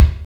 Ensoniq EPS Kick

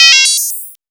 MOOG HAT; AMG - Vince Clarke Lucky Bastard

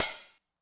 open hat Med Decay, 909; classic machines; 909mod; OH - OPEN HAT

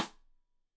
Side Stick Stereo; Deeply Sampled Drums

